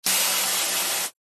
household garden hose spray water jet plastic bin lid